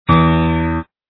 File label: piano